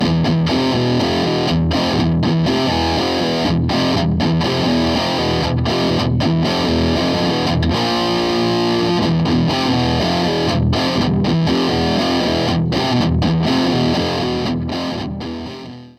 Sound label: ampeg gvt52-212 high gain; Gitarrenverstärker